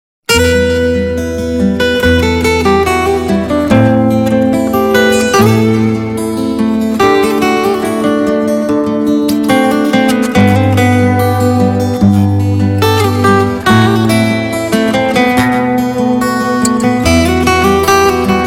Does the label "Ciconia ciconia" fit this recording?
No